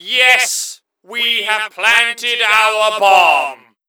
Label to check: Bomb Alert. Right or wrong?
Right